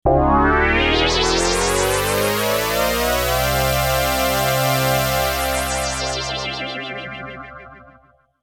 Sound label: Rise Up